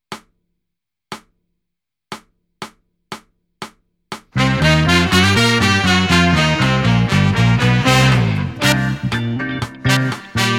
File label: Backing with click